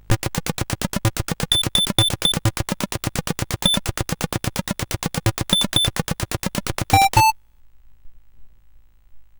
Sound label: sid, CSG8580R5; Coco-Notes